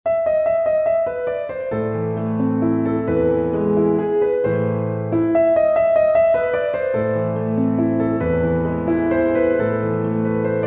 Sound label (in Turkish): santral fon muzikleri